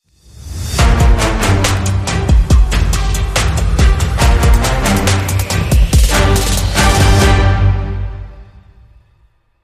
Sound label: Hybrid Sting Thirteen Short